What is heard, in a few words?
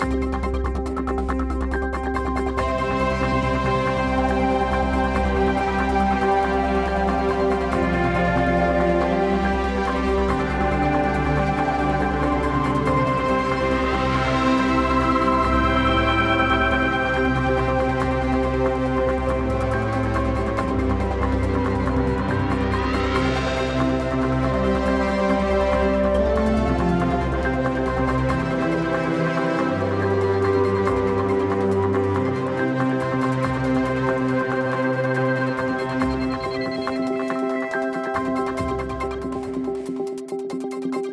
Player Winning